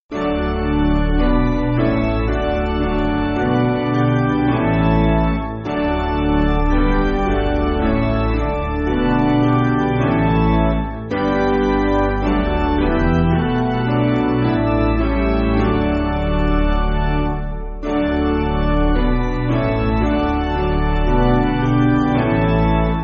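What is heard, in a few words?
Piano, Music Backing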